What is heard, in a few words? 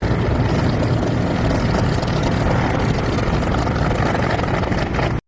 Sea Hawk roll